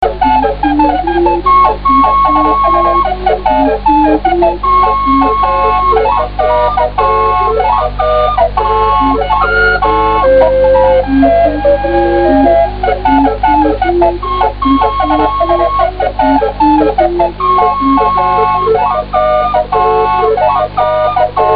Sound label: caliope